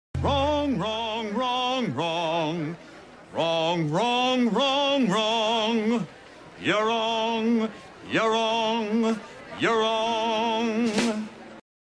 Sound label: wrong